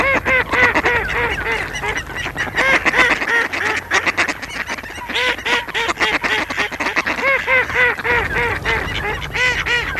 GERMANO

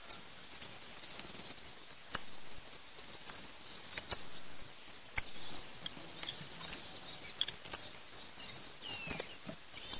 zip

